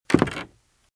Footsteps - Wood - Creaky - Walk - Left

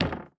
Street Ball Glass Back; SFX Kit; Street Basketball

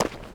dirt; firefight

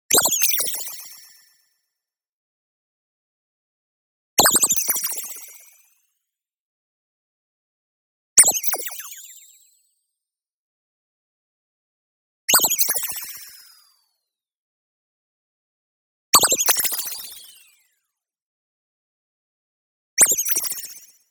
zap, complex ping low; boom library; Anime Essentials; DSGNMisc

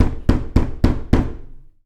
Door Knock Pound Wood; Doors

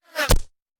Bullet Impact; Sound Ideas; Guns

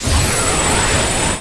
Scifi Power Up; Energy & Forcefields

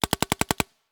Paintball Gun; Sound Ideas; Guns

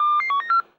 walkie talkie message send; firefight

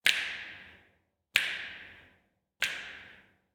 snaps; human; NS Studios - ultra sound collection

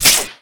Web Stream Shot, Weapons

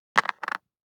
DICE on Cardboard, Drop, Standard, Five Dice; Samples; ArticulatedSounds; DICE Sfx Party (Extended)

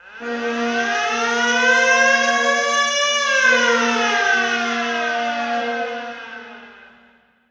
Hockey Goal, Hockey Horns & Buzzers; SFX Kit; Toronto

